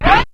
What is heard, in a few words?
D70 DRUMS; Rubber Chicken Software Sample Bank